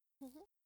笑い声; ループボイス

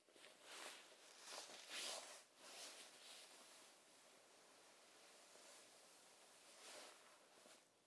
衣擦れ寝ころんだ足元へ; 動作系

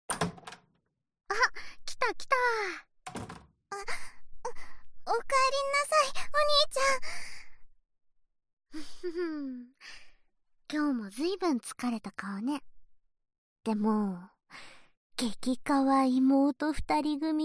ヘッドスパ＆耳舐め

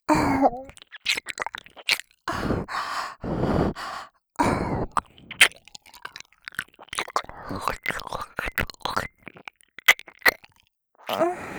耳舐め 喘ぎ; エッチしよ; シャルロッテ

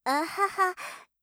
あはは…, 元気

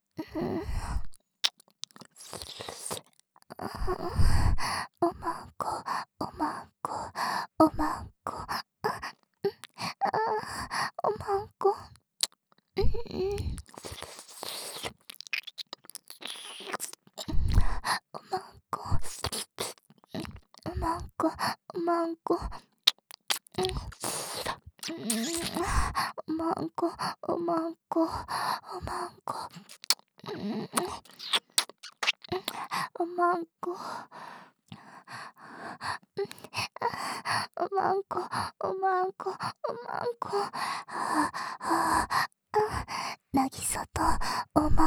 耳舐め喘ぎ; お屋敷メイドのハーレム淫語ヘヴン 気が済むまで耳舐め淫語を堪能できるバイノーラルオナサポ音声

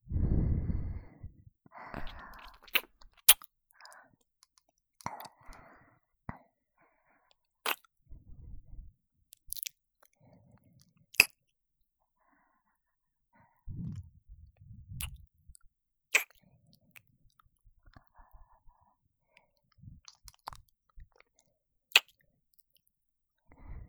キス音のみ, ASMR; 双子の少女にイタズラされてダメな大人にされちゃう音声; 亜子麻子姉妹に寝かしつけてもらう

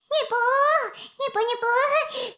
シコシコ音声, ASMR; 古き城のドラゴニート2

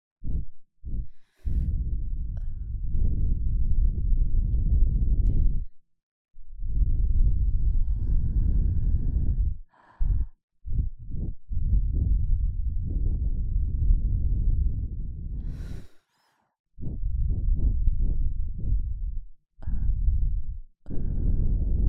お耳に息を吹きかける音(両耳同時)

